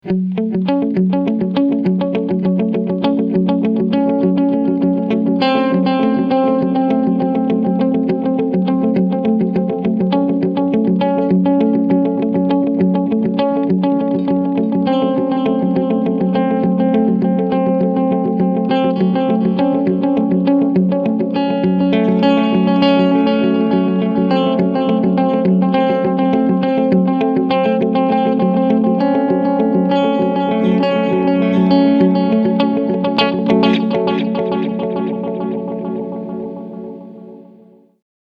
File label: KS Medium Delaytime